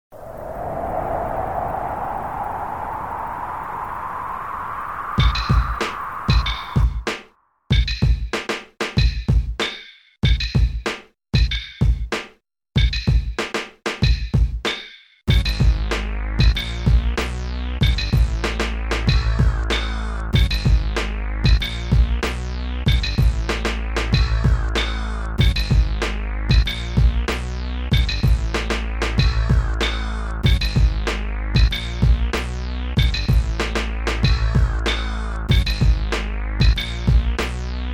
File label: beat